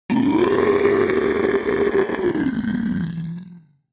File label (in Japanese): 叫び声2（ウワー）